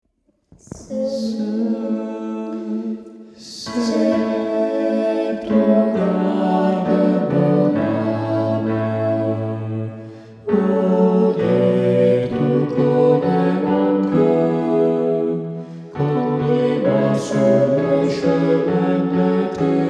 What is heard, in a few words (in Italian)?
seigneur coro